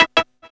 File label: PULSE E; AMG; Global Trance Mission VOL-1